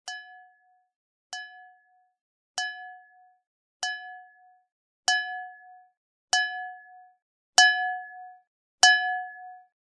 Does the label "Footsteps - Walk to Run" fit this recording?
No